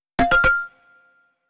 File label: get item